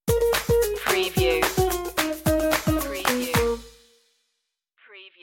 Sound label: thumbs up island stinger